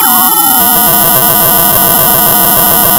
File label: pop, tearing paper